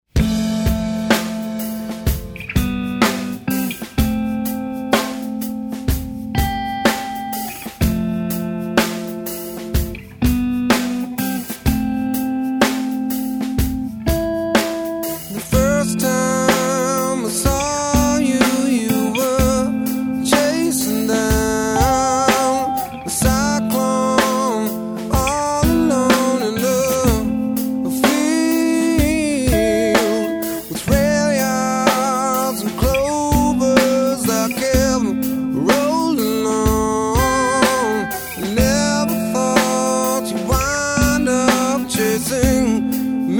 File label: classic slow